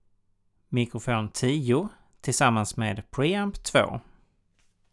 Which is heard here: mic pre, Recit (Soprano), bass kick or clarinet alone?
mic pre